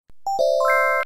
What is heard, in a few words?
Alarm default; LG L600v